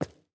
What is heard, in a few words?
refined concrete, walking; factorio 2.0.55